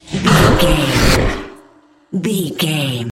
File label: dark magic conjure blast